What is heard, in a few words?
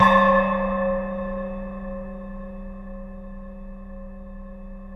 space bass M; InVision Interactive; Lightware VOL-1; Instruments & Percussions; DEMBY